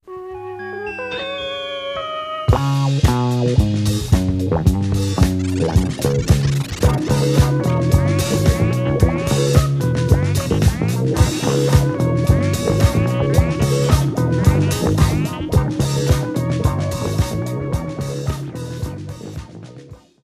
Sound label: laughing